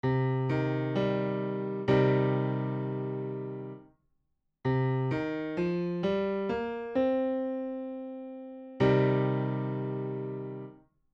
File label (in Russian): pentatonika minor zvuk; Минорная пентатоника; Основана на натуральном миноре, исключая II и VI ступени.; Пример: до ми бемоль фа соль си бемоль.